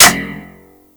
UMP Clip Eject; Warframe; Weapons; Corpus

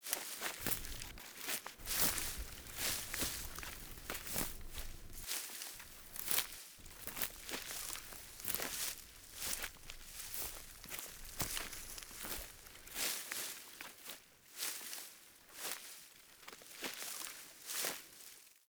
Wolf Steps Run Loop Vegetation; Animals; Footsteps

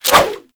Sword And Whip Whoosh; Warframe; Weapons

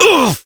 Pain, Adult Operator; New War

